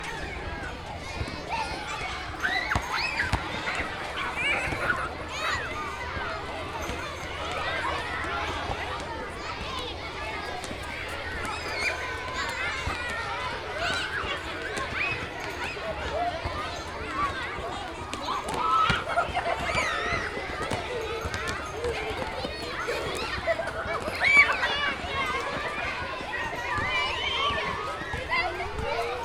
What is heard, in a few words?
Soundscape Elementary End Of Recess; Chaparral